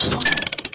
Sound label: tank repairbox; blockade classic